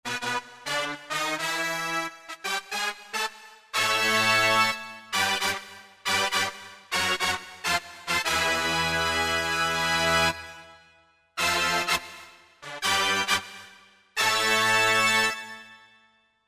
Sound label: Brass Section, Roland JD-990; Factory Patches